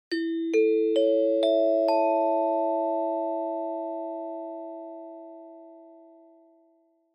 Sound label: Chime Announce